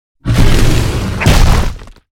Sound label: attack; hero sound